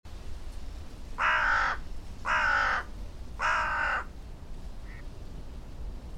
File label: Corvus corone; SETE